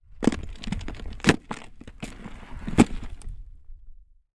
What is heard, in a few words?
Sand Pebbles; TOOLKIT vol.2